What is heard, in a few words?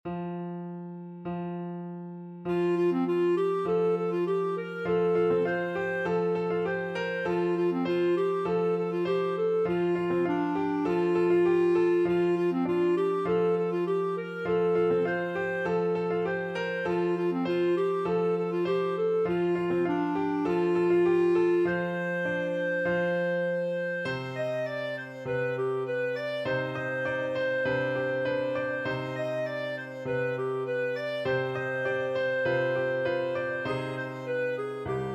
mi y malel, clarinet